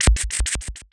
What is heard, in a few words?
Anomalous Rhythm; Convolution; Special Effects; IR Samples; Kontakt 5